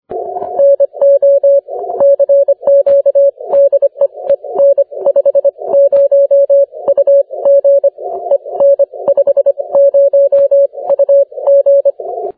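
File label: 30m continuous wave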